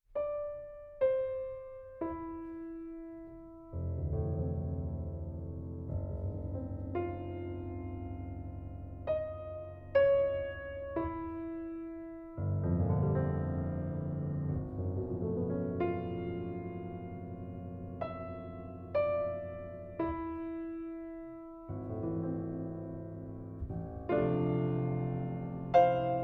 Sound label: Concerto For Piccolo Trumpet, Adagio Semplice